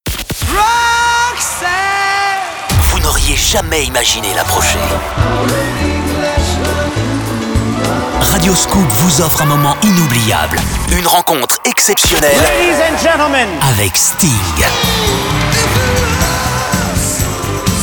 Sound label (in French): promo musique sting